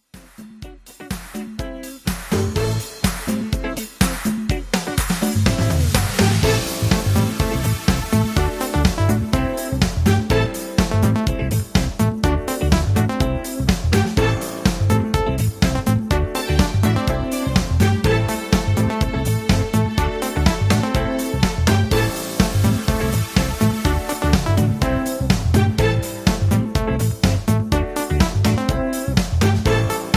Hey Girl Instrumental

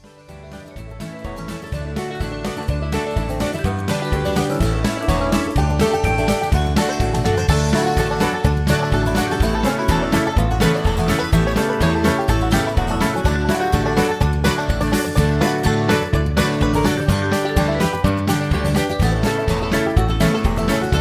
Instrumental

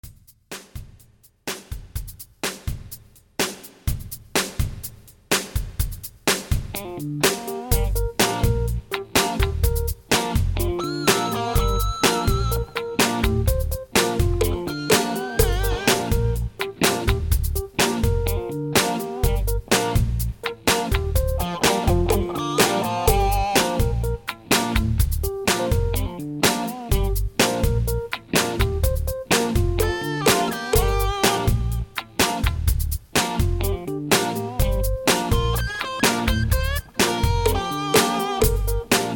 with Melody

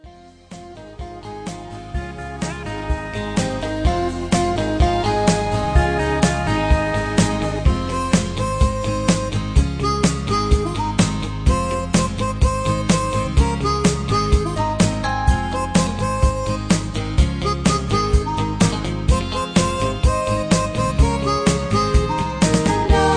Instrumental With BGVs

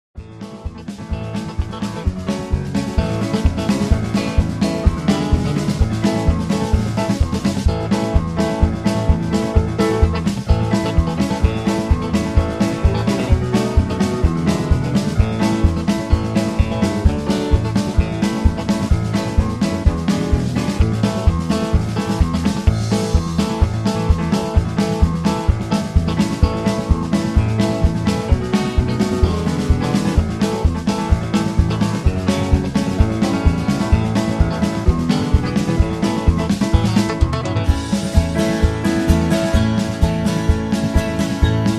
instrumental No Melody

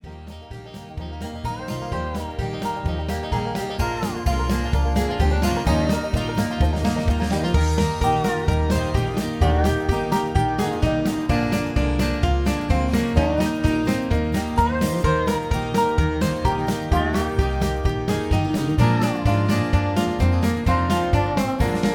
Instrumental

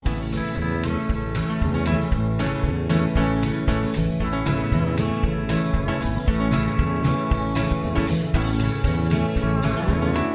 Instrumental

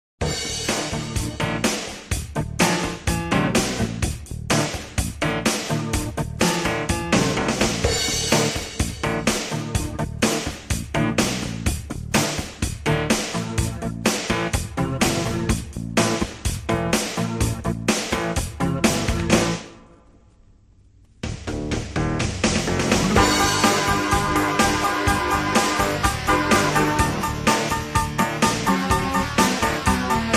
instrumental

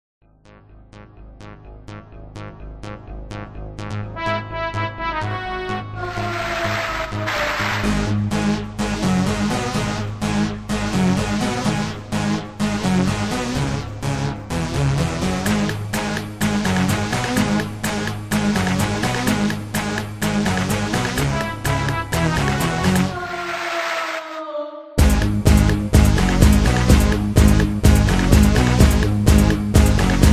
Music

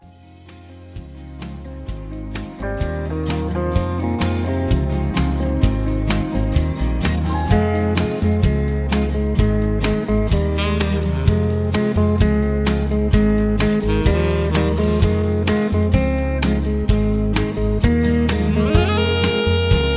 Instrumental Low Key with Leads

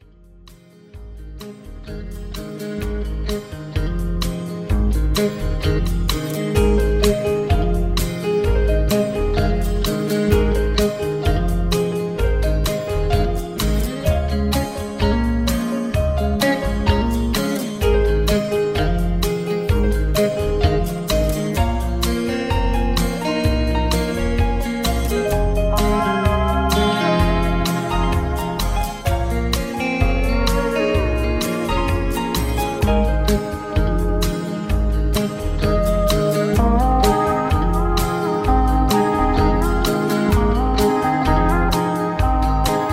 Low Key

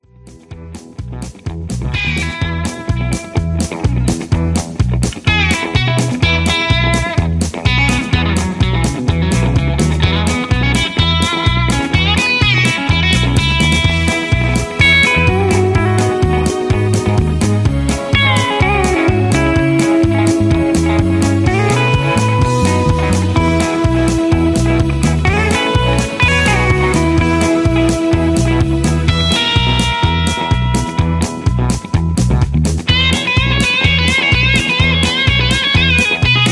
Guitars Hoedown Patter

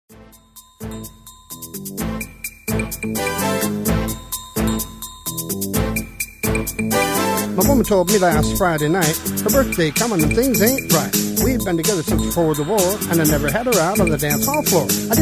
Instrumental with Rap and Harmony

